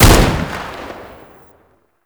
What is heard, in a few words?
AK47 Fire; Warframe; Tenno